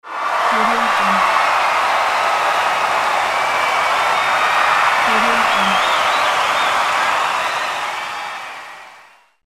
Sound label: crowd cheers